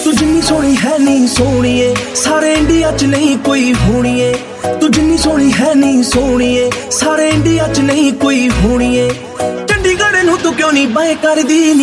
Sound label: Bollywood